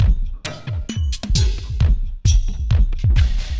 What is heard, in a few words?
thump 133; Loops